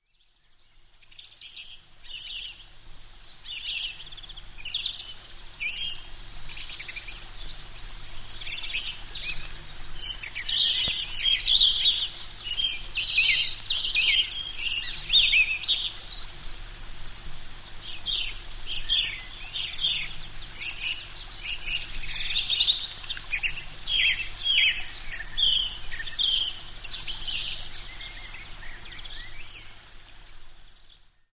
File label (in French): oiseaux